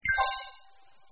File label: dialog show; Delicious Emilys True Love